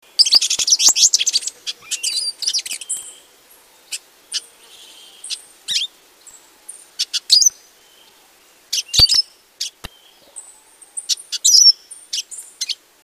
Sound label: Yellow-bellied Siskin